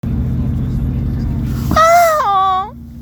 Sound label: aaaah